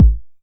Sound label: 909 BD; Goldbaby Tape808 & Tape909; The Tape 909